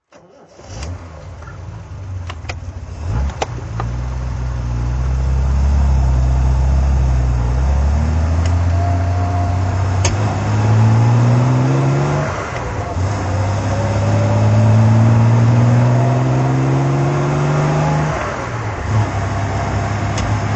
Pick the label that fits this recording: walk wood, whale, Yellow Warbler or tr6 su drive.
tr6 su drive